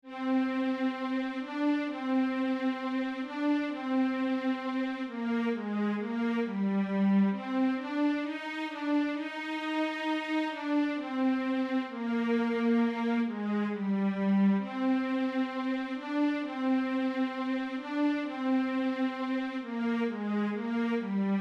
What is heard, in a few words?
piano concerto